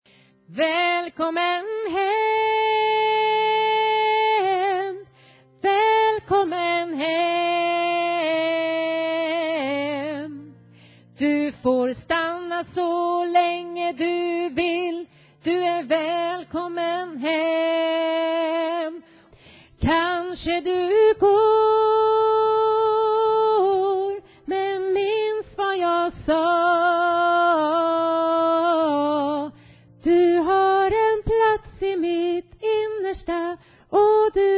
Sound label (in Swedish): alt, stämmor